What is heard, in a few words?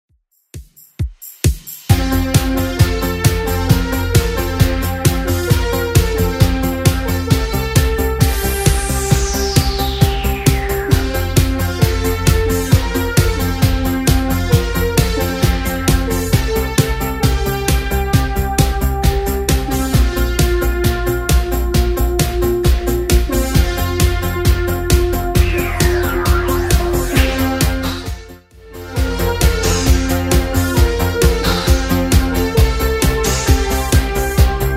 80s Redrum 133bpm